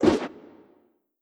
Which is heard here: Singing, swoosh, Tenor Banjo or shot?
swoosh